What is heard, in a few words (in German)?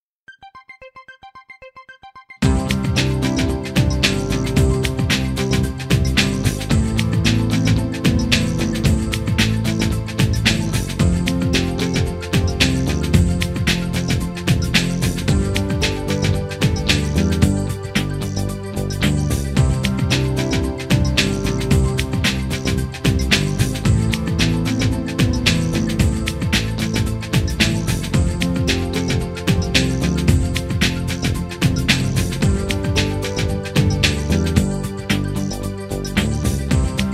blockflötentaxi